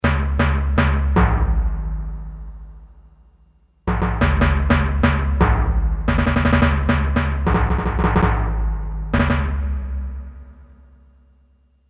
Timpani, Roland MT-32

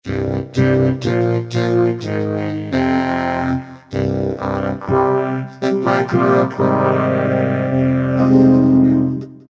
Vocoder 5th, Korg microKORG; Factory Voices

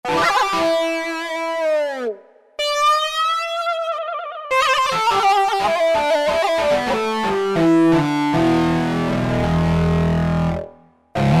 Dirt Gitarr

